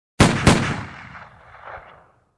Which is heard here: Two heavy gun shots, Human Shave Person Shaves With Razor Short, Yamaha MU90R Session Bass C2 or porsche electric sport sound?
Two heavy gun shots